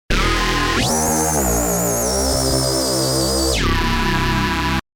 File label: electro